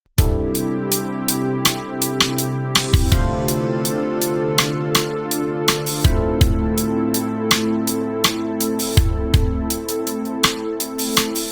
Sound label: reggae; hintergrundmusik